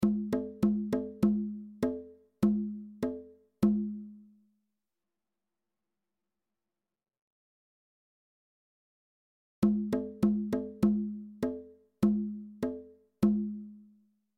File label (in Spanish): bongos; reciclar tocar